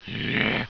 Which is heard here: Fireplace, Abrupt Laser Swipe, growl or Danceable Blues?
growl